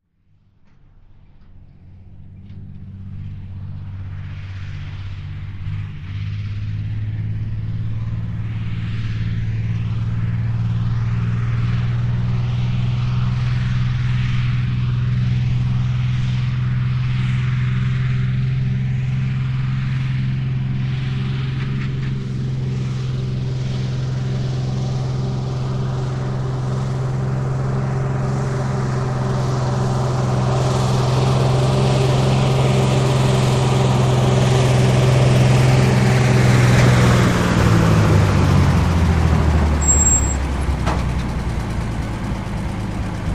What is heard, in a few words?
Truck 2.5 T Slow Idle Of; 2.5 ton